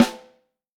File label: MHT, Vintage Snare Drum; Q Up Arts; Johnny.C's Vintage Snare Drum; 5.5X14 SLR